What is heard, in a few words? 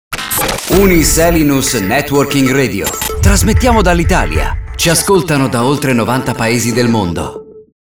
Jingle ITA; Uniselinus